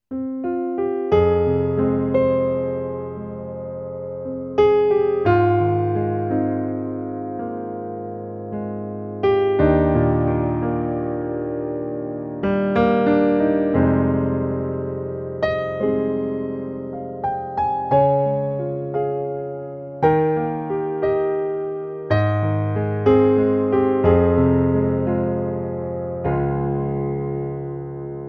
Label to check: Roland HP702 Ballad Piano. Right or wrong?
Right